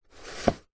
household book slide in bookshelf; zapsplat

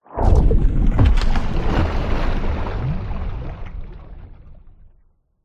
water whoosh impacts movements; george gazetis

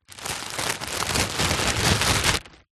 plastic bag roll up by hand; zapsplat

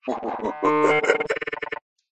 horror evp ghostbox demon laugh glitchy receiver

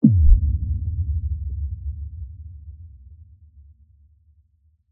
impact bass thud attack descend rumble; Skyclad Sound

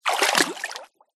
foley footstep foot down into one foot water

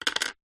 office pencil set down wooden table

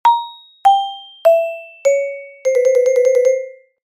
cartoon descent xylophone flutter at end

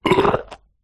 human burp vomit sick

